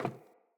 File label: Sandals Run Wood, Footsteps